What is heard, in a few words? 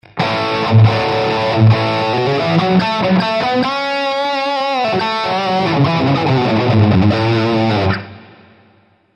plexi HU Tube drive; PODxtLive